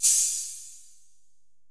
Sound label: 808 CHH, 480L; Wizoo; Platinum 24 Electronic; 480L MP 808